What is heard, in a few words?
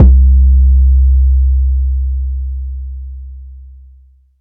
phonk kicks oneshot - 808 C; kick drum